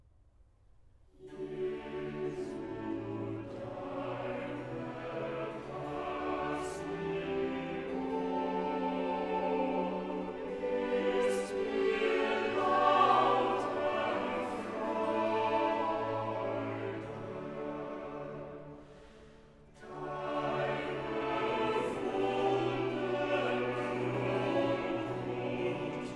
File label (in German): Choral; Cantata, BWV 159 'Alt Sehet! Wir gehn hinauf gen Jerusalem'; 'Jesu, deine Passion'; Bach; Cambridge; Quinquagesima